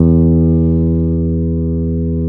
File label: CP70, Keyboards Old School; USB Soundscan vol.09; AKAI